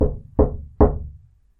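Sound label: knocked in bathroom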